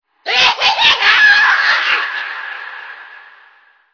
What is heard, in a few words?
cackle